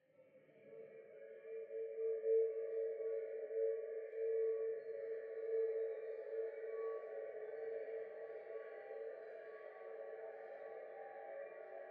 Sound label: impulse response